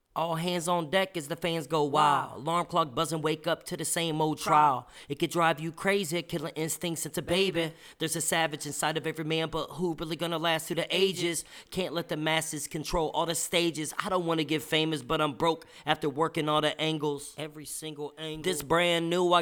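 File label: hip hop, 112, E minor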